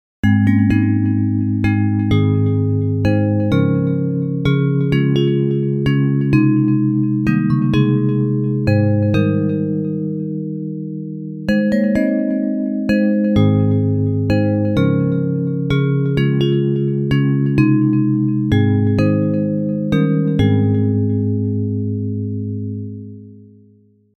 Bells

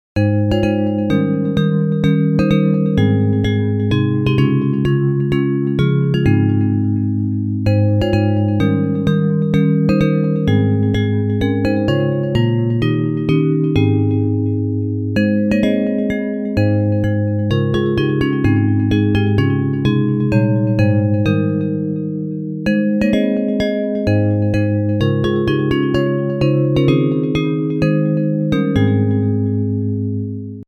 I Am Thine O Lord Bells

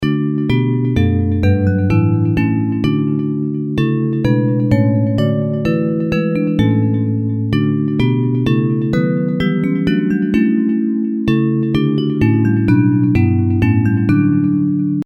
Come and Let Us Sweetly Join Bells

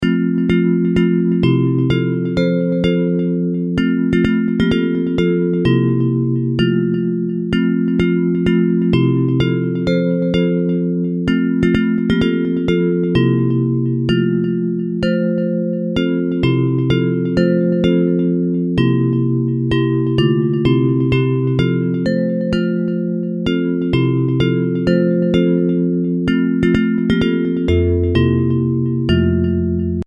Nothing but the Blood Bells

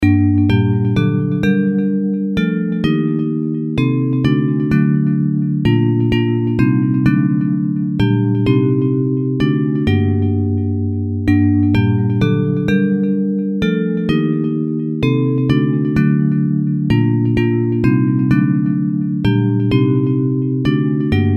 Bells, hymn

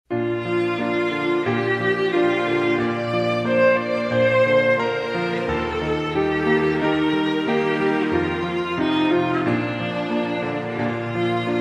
For Violin, hymn; Come Thou Fount of Every Blessing

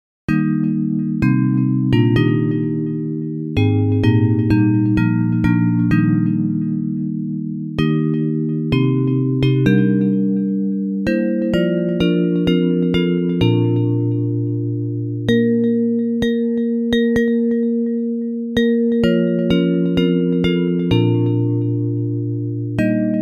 Bells, hymn

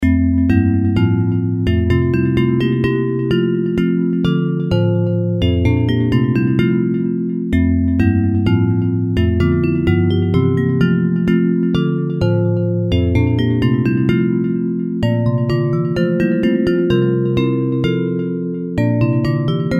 Bells; Once in Royal Davids City

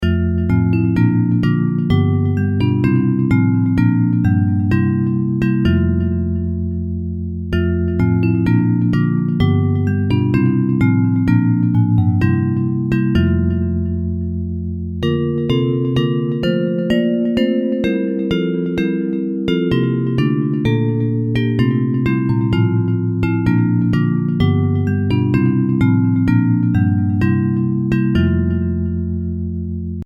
God That Madest Earth and Heaven Bells

